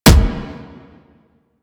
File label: Explosion Punch Generic Small; Warframe; Weapons